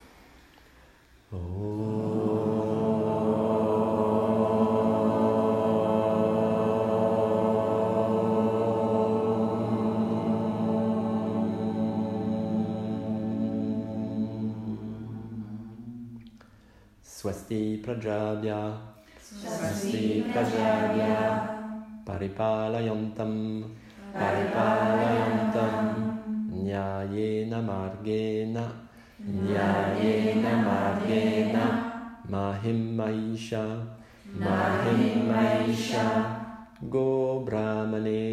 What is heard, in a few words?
closing asthanga mantra